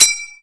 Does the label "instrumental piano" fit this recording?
No